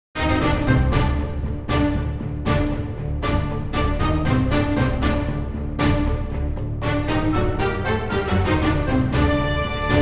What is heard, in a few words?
orchestral; The Great Persia